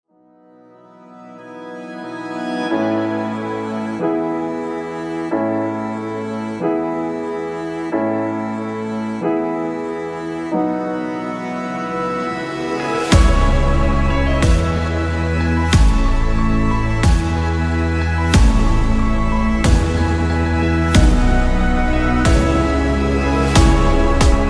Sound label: Instrumental